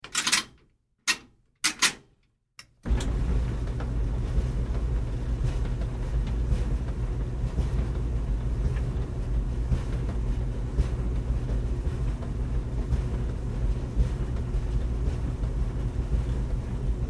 Household Dryer On Run Off Dial Buzzer Short; Cleaning

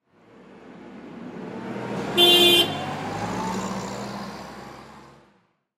Forklift Pass By Honk Horn; Machinery; Machines; INDUSTRY

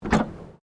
Car Ford Focus Boot Open

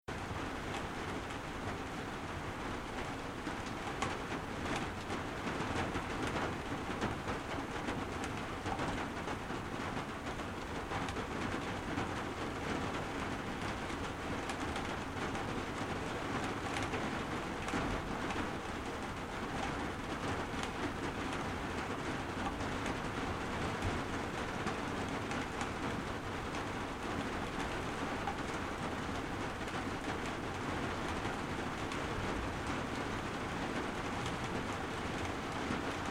Rain On Small Caravan Tin Roof Van Steady; Transport Weather

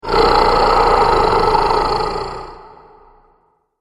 Jungle Dinosaur Noise; Dinosaur Sound Effects

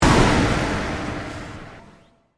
Hit Burst

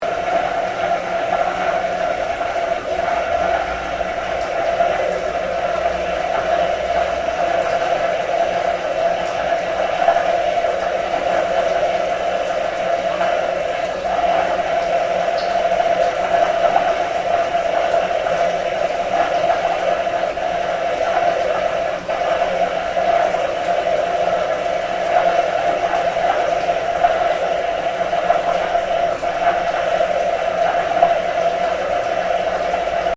Industrial Pump Draingage Underground Loop; Various industrial machines; Machinery (Sound Effects:Machines)

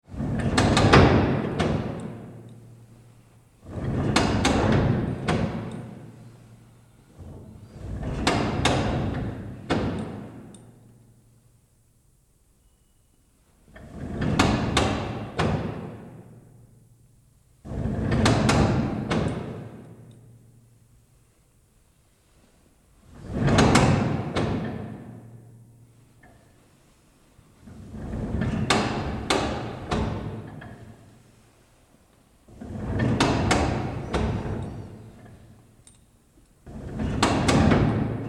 Hand Roller Towel; Tom Hutchings

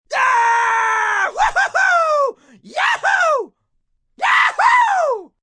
Cheer Yahoos, Cheering, male, excited; Crowd Sound Effects

